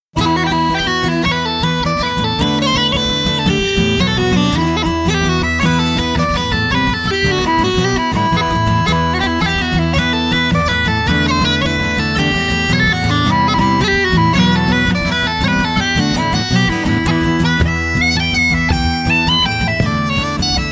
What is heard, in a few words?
Jigs